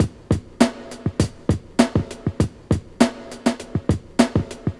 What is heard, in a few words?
100bpm, breakcore